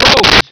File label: rhodes